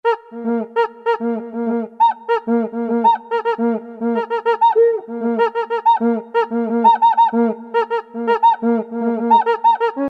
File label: cuica, Latin and F.X. Percussions; Roland SN-U110-02